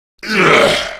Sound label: Death Grineer Male; Warframe; Battle Barks